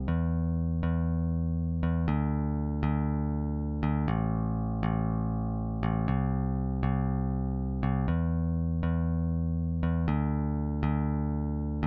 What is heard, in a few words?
Bass DI